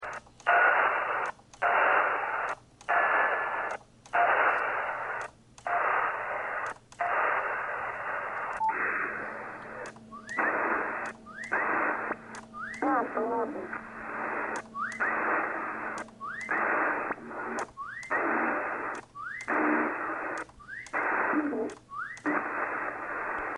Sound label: echo